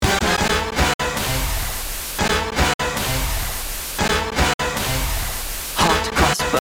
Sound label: RUMBLE; Sea of Secrets ~ Beneath the .WAVs